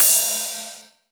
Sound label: Rides, Cymbals